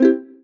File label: PUZZLE Success Guitar Very Fast Three Note Dry; stereo; Universal Sound FX